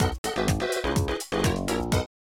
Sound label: 2nd Place; Hugo Bukkazoom; gba gamerip 2003